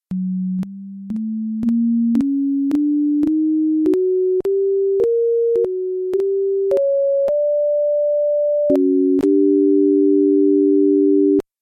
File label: sine wave